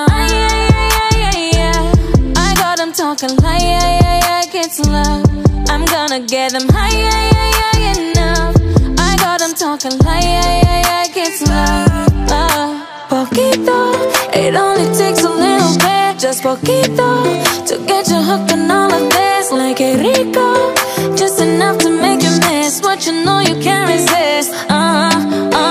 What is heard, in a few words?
Love Ringtone